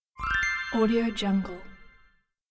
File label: game point